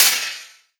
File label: DRUM CRASH, DNB; KULTURE